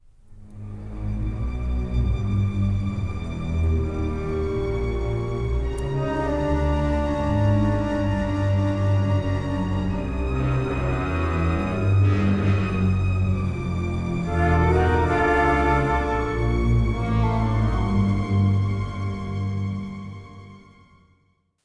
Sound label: suspense, music